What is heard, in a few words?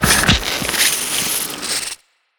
damage